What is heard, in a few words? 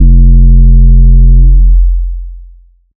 808 Bass Smooth F3; Slate 808 Deep Dive; SD Signature 808s